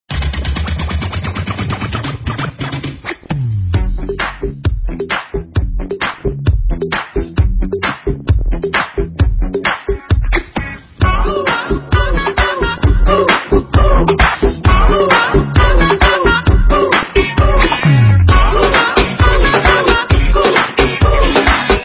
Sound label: remix for iphone